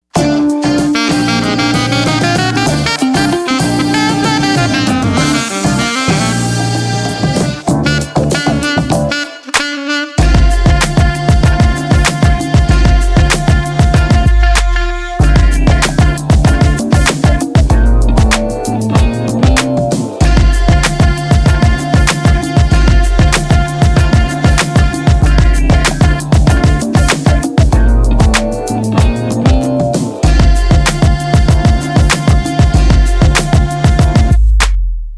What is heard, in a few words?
Instrumental